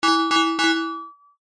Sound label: ring; ding